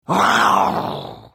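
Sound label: Attack, Khajiit female; The Elder Scrolls III - Morrowind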